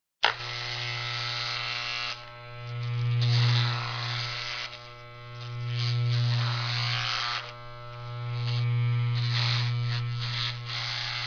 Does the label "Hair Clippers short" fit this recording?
Yes